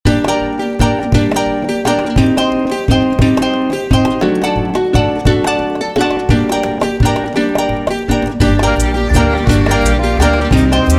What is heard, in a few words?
polka